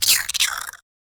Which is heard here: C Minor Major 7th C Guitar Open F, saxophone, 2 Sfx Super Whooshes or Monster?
Monster